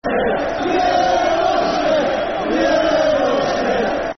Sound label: Football Chant, Soccer Songs; You Are Shit; Hereford United Hufc